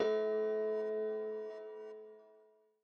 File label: game Start